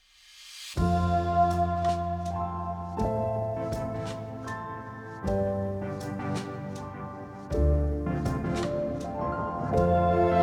Inspirational Emotional

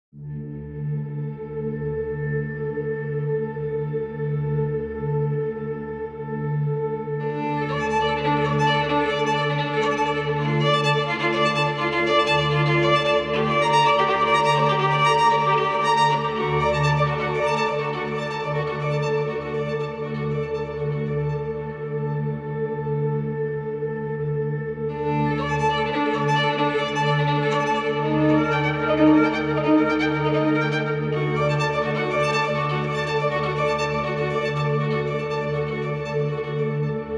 Despair for Solo Violin

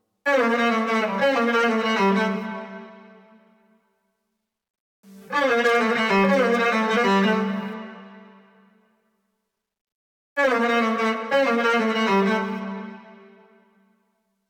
Solo Violin

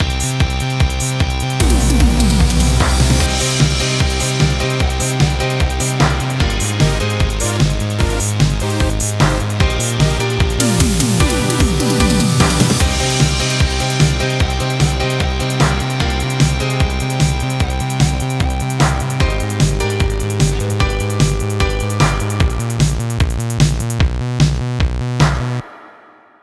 Windy Blues

